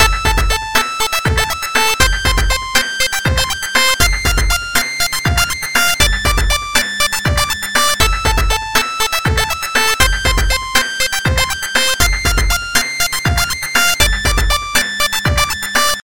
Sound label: Chip Beat Ringtone